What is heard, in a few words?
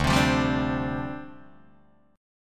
D Minor 11th D down Guitar DADGAD